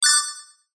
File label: multimedia game tone sci fi futuristic beep action